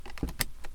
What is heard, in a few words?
Click Seatbelt